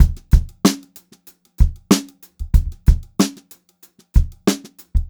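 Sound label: STRAIGHT BEAT, Funk-Groove Drumloops; USB Soundscan vol.38; AKAI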